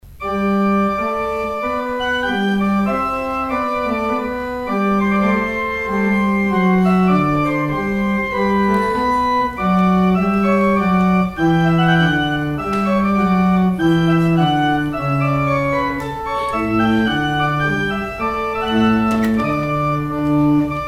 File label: organ outro; Organ exit music